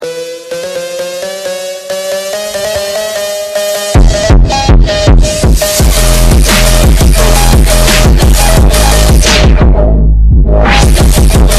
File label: phonk; meme